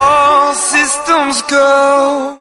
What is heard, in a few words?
all systems go SUNG